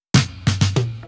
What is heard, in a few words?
Fill 128 BPM; Vengeance Samples; VEH1 Loops